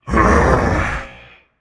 ugh, voicepack; smite; jorm

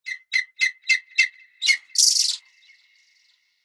ambience good bird; smite; conquest

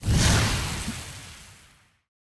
rocket tail; smite; vulcan

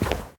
footstep; smite; olympus

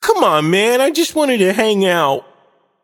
death; smite; voicepack; merlin

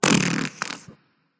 tongue, voice; Smite; ymir skin14

